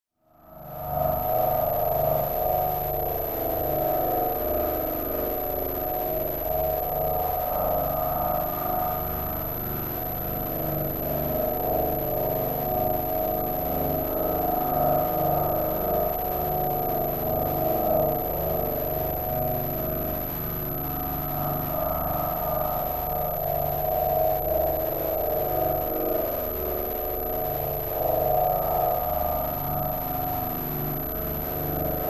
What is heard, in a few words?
Drone Static Distortion; Drone Static Distortion Pulse; Production Element Imaging Element Texture Bed Atmosphere Synth